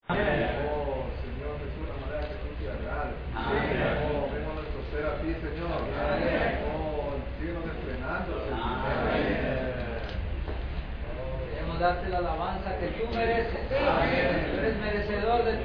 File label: Hymn Singing Training